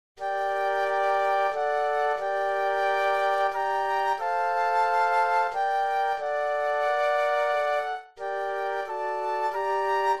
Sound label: Carol Flute Quartet; christmas